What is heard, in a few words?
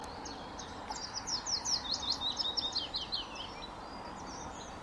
Willow Warbler, Fitis